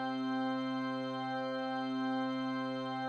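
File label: Church Organ; fourier transform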